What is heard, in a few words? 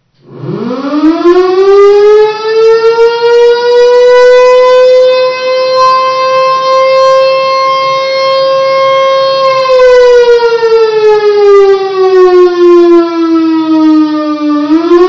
Fire Siren